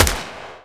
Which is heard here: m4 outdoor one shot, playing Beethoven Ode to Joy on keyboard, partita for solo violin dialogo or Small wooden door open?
m4 outdoor one shot